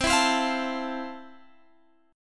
F Major 7th C

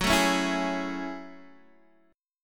Gb Minor 9th, Guitar DADGAD

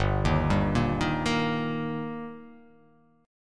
G Minor Sharp 5th G, chord; arpeggiated; JGuitar

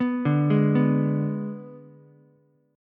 G sharp Diminished G sharp, down, Ukulele; arpeggiated

